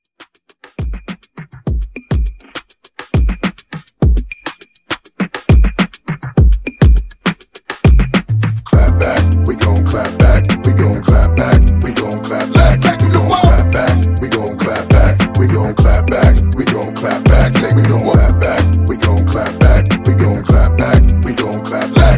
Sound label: Mixshow Hype Edits 102